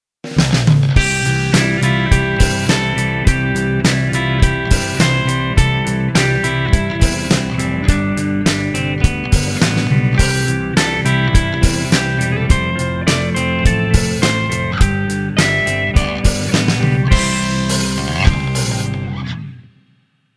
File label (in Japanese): ドラム